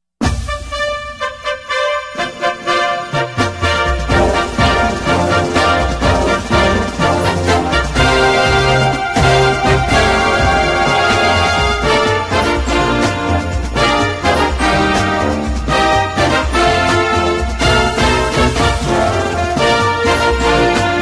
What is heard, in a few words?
jingle bell